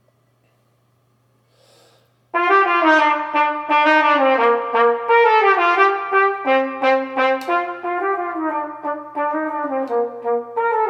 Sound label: Tenor Music